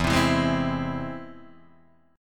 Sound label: Ab Augmented E